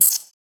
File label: alien, creature